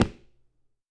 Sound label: Dress shoe